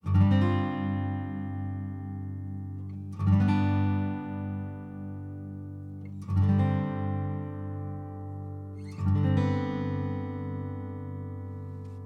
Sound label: Night Chords